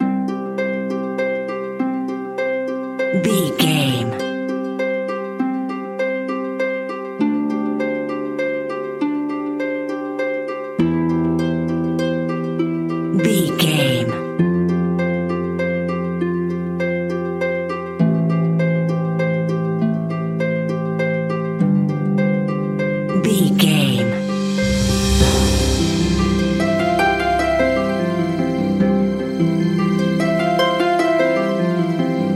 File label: beautiful village no lead